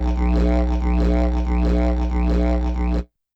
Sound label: Digeridoo